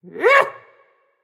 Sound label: grunt attack; smite; ahmuzencab